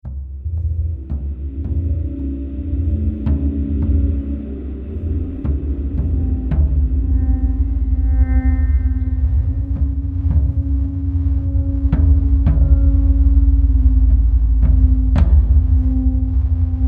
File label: Combat